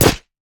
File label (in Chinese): 狼甲受损声